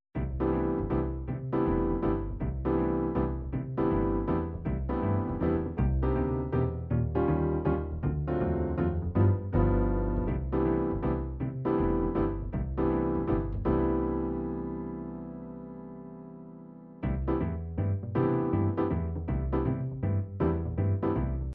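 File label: psr9000 jazz waltz, midi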